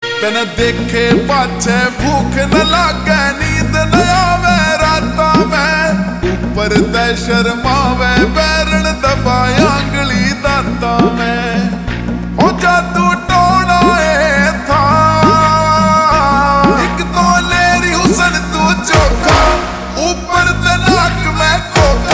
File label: Slowed Reverb